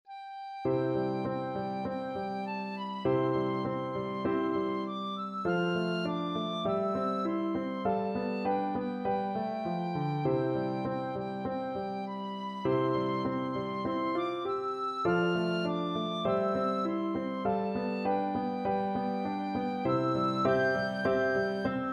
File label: tin whistle